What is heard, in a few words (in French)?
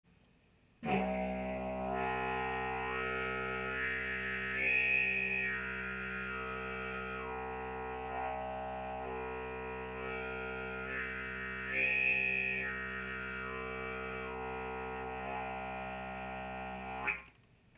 harmonique, Les harmoniques, didgeridoo; Faites le bourdon de base, puis bougez votre langue, gonflez et dégonflez vos joues